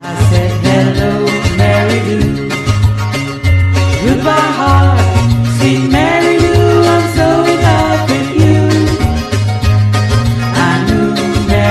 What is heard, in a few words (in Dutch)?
zang